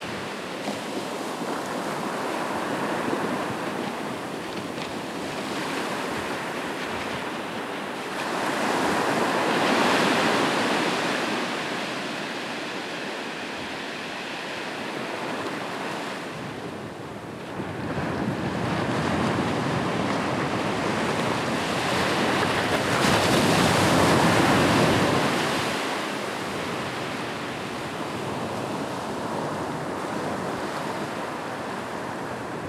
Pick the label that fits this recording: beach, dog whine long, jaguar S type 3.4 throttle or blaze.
beach